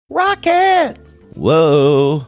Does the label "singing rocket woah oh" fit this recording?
Yes